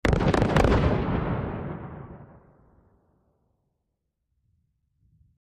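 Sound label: 1x8oz 2x16oz Black Powder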